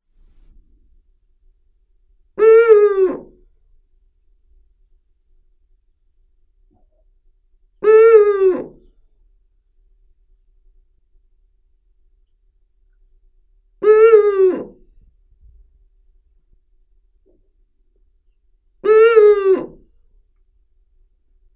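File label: eagle-owl bubo bubo; filin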